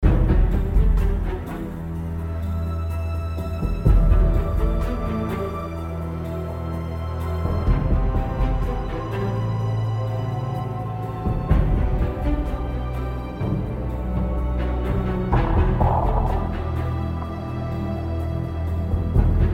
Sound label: stock music